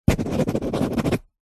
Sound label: office pen biro write sign signature on glossy paper